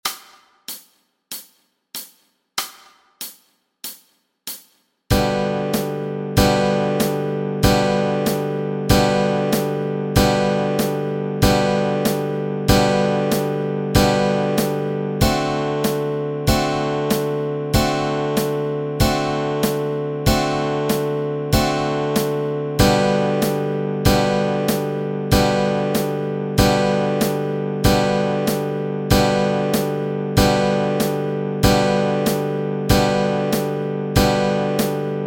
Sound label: Chords Track, Easy Guitar